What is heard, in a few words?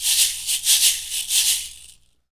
shaker, shaker loops; Roland LCDP14 Africa VOL-2